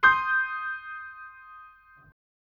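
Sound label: C minor highest, chords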